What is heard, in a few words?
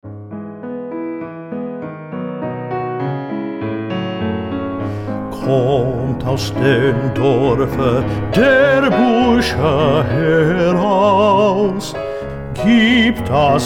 bass 1